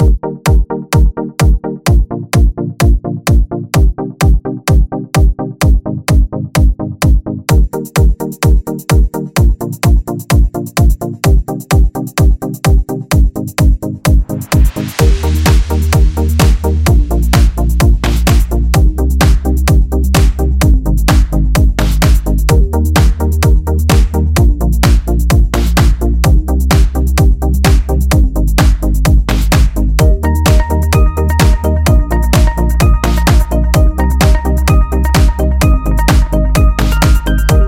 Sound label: Simple Upbeat Positive Corporate Background; corporate music vol 1